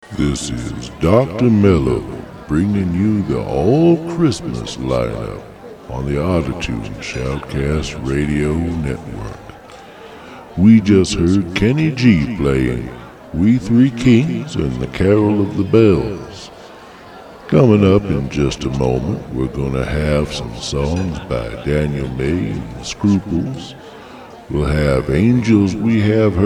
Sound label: christmas jazz